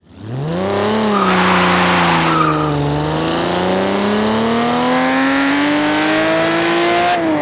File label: first, vehicles; gmod; tdmcars; fer 250 gto